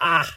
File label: Scream